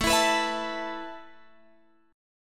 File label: A Suspended 2nd, down, Guitar, Open F; Asus2; strummed